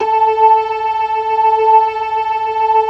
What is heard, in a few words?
LO MAN V R, ORGAN LOWER MANUAL; Keith Emerson Signature Series